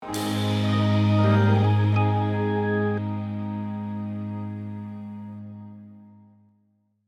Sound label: Funky Jive End Logo